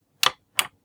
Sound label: Big Mack Click